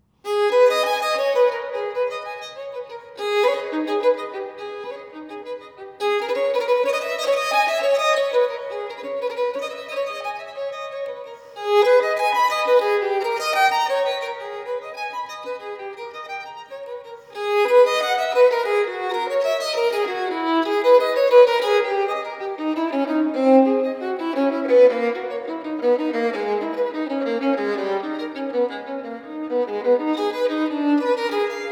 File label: Violin Sonata in A Minor Allegro; Violin Sonata No. 2; BWV 1003; Bach